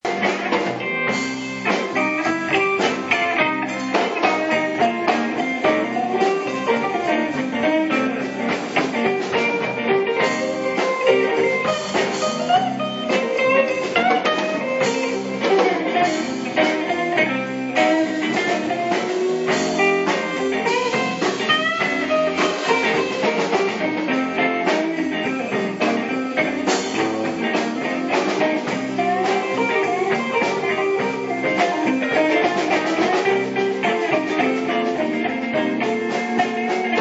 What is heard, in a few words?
Jazz Funky Riffin; dance band